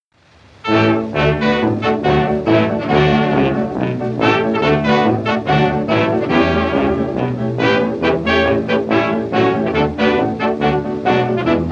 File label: Orchestra